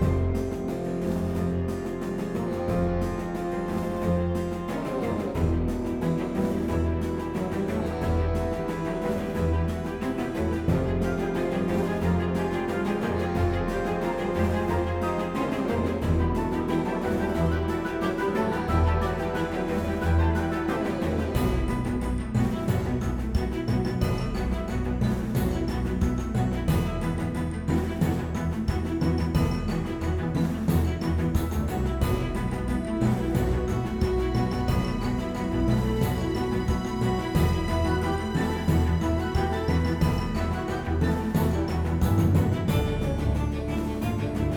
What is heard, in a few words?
battle big military; Shadowhand; macos windows gamerip 2017